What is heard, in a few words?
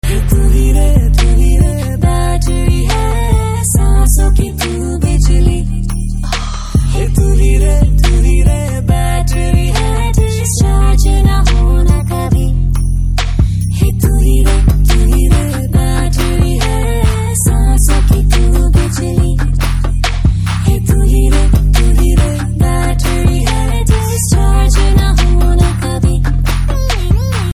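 background Tone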